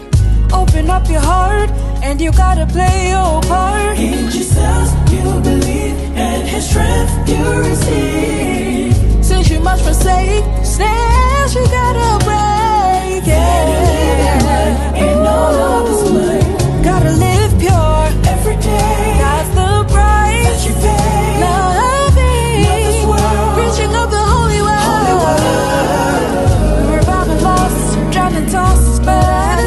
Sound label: Amen Acoustic Version